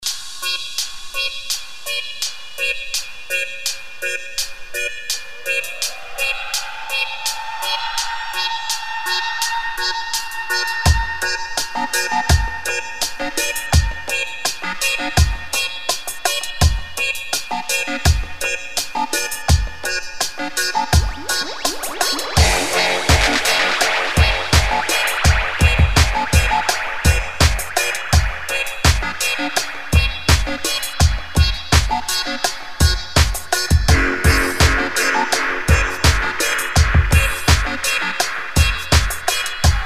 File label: space station stepper; squarewave